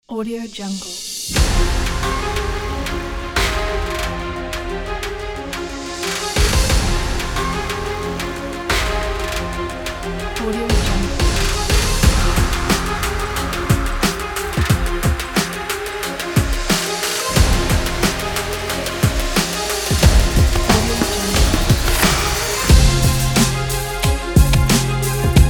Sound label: workout